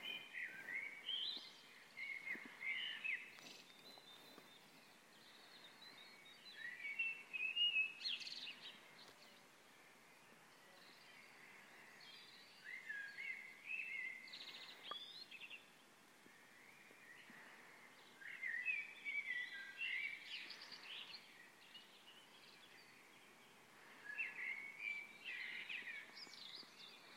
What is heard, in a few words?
dawn chorus on angel road norwich